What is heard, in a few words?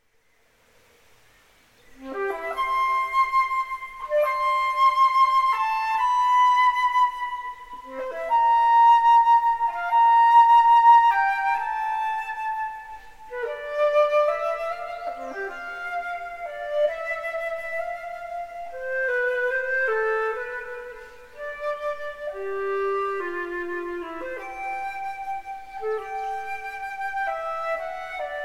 Suite in C Major, Sarabande; Suite No. 3; BWV 1009